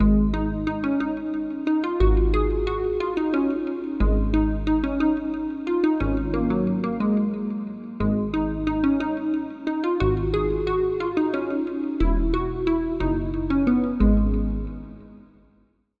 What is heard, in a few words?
Pluck; Hidden Valley; Routed Sound; Spire Expansion; Story Walker Vol.1